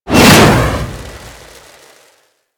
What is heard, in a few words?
Jet Polearm Whoosh, weapons; Grineer